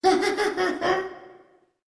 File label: Smile Chuckle